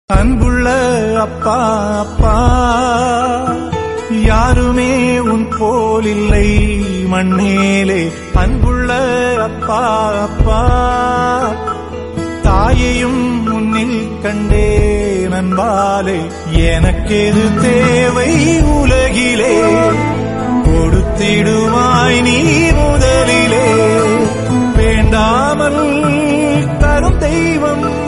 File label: song tamil song